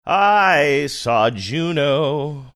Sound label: singing i saw juno